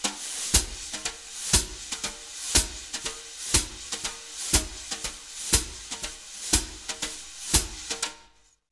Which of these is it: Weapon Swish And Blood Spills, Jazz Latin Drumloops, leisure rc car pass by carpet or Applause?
Jazz Latin Drumloops